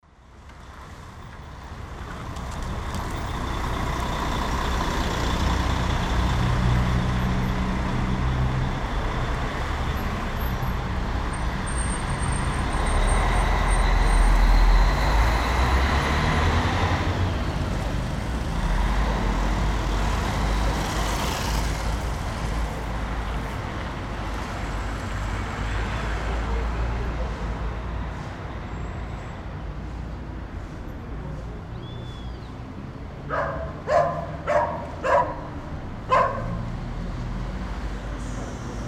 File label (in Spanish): calles; alsina y san lorenzo